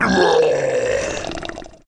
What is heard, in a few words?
zombs death; The Monster Plane; kolmods death